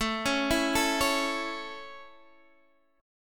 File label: A Major A; arpeggiated